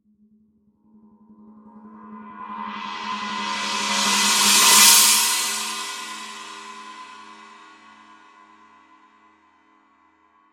rude 18 novo china roll, paiste

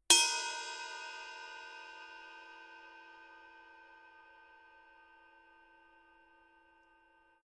900 series 22 heavy ride bell; paiste

